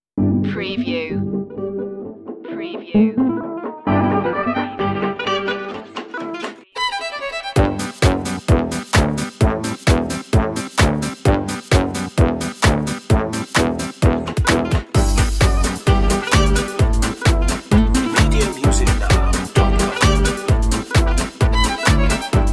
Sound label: doo wop bop no vinyl